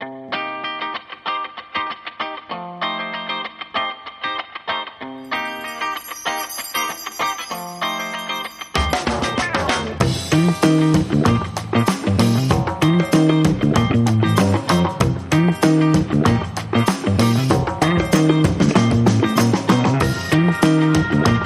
Clean No Sustained Guitar Mix

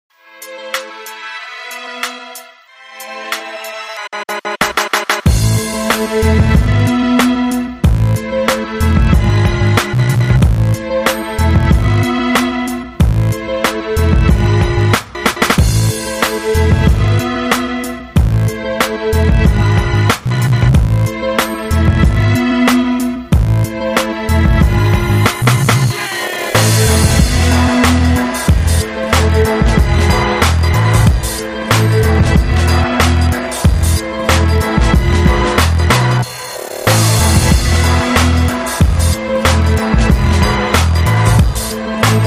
Steady Groove Mix

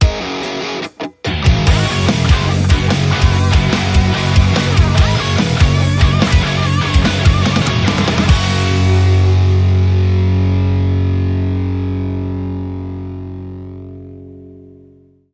Funk With My Rock

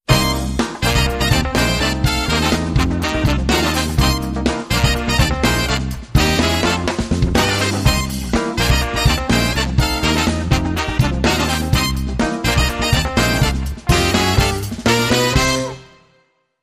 Funk Brass

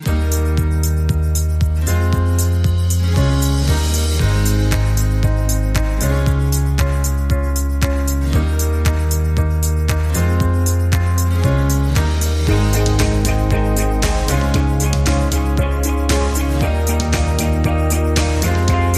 Underscore

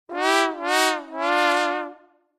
Trombones Wah Wah Wah

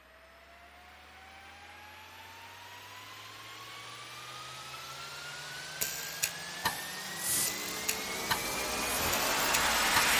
Percussion Bass and Effects

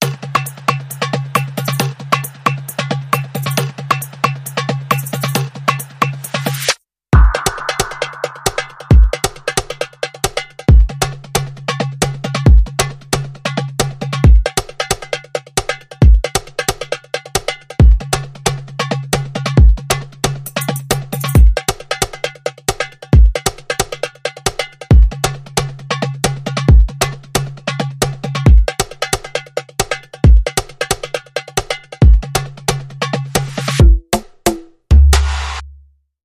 Pots and Pans Beat

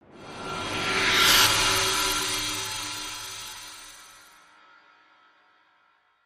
Sting Reverse Metal

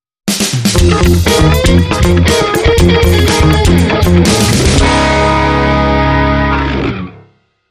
Funky Organ

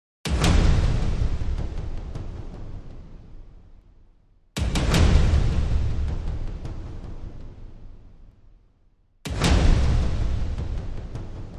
Drums and Perc Only

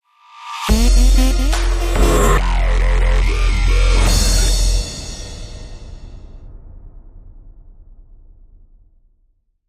Trap Door, Sting

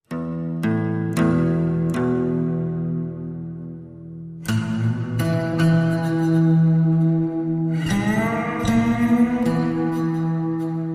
Outlaws Bed Dustbowl Drift E minor, Guitar Only

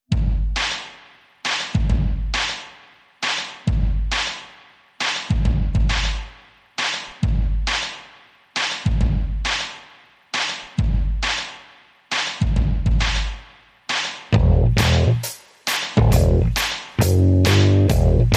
Drums And Bass Mix

